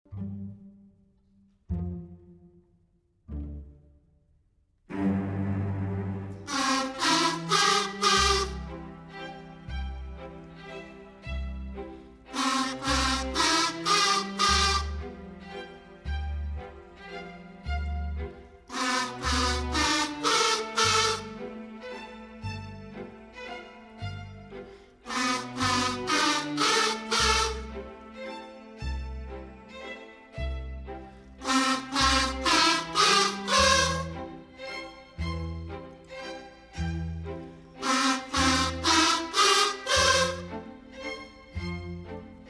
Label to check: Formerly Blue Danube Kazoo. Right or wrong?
Right